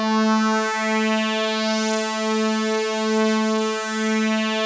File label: OB A4, Rare Synthesizer; Hollywood Edge - Giorgio Moroder Rare Synthesizer Collection; OB 4 VOX.